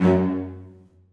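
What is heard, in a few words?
celli staccato f#3, Symphonic Orchestra; Sonatina Symphonic Orchestra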